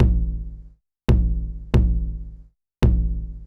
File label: 909 TOM, Techno 138 BPM